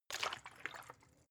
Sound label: bottle water upend slow; berufe